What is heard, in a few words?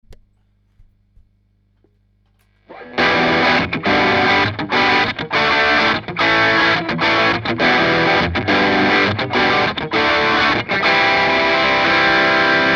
FENDER American Special Telecaster